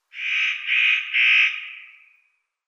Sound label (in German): Tannenhaeher Nucifraga caryocatactes, Vogelstimmen